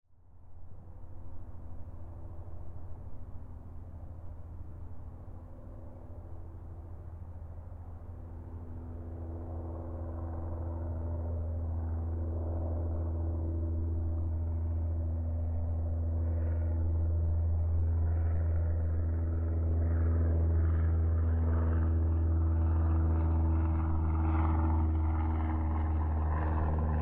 Airplane - FLY - By - Overhead - Groan; DPA4021; Pole Position; The Aircraft Ambient Library

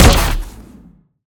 Assault Rifle Single Shot, Future Weapons; Alliance; SoundMorph

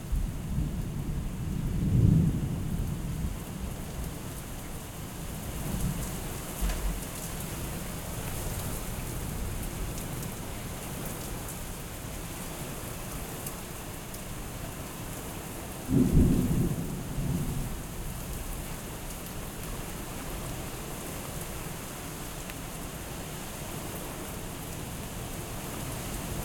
rain shower of rain steady rain thunder; Storm, gale and thunderstorm; Invisible

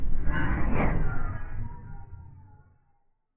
SPACESHIP PASS BY; Chupapsound; Essential Scifi

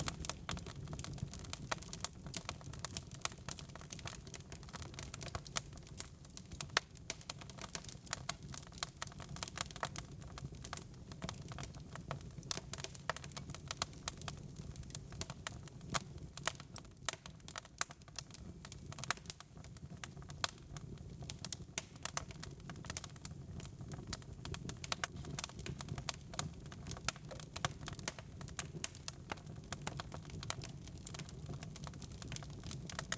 Campfire; Stefano Cremona; Pure Nature Ambiences

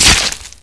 butt impact, antlion; hl2

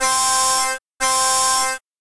Bonus Alarm Loop; David Dumais Audio; Alarms Sound Fx Pack 1

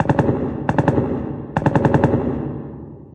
combat, ambient combat far; hl2

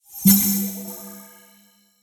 heavy complex hi-tech tone; UI SOUNDS - FUTURISTIC; Sound Ex Machina

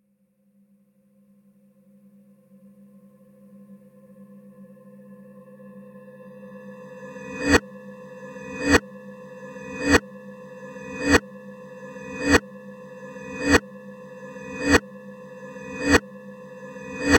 Clock Tick Clock Multi Reverse; InMotionAudio; Sinister Textures 3

